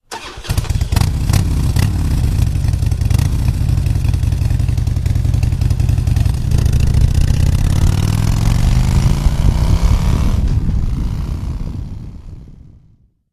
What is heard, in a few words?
harley start